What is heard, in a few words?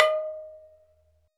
MALLET